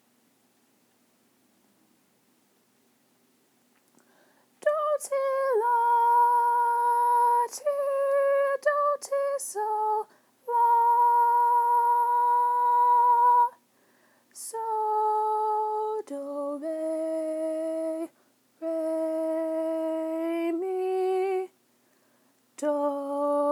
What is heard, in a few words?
alto